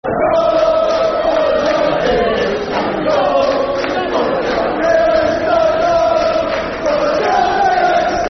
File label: [Football Chant (Soccer Songs)]